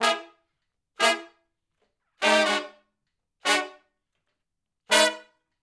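Shuffle Blues Riff [V, IV, I] (B) harmony, Horncraft for R&B; Sections; Crimson Blue and Fabulous Horncraft 4 RnB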